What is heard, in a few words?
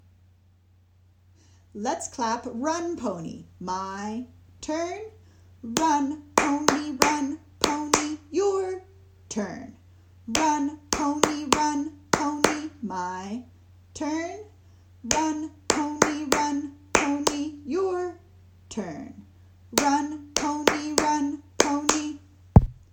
Clap